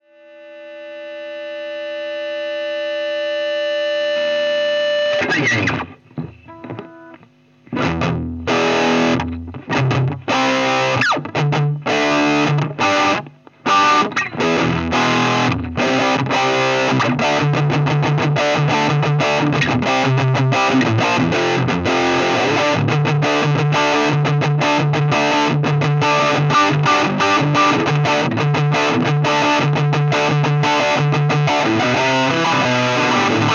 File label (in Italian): higain con booster